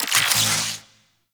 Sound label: Infuse Shock UI; Warframe; Warframes; Alchemist